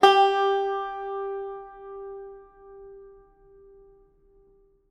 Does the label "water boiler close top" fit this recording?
No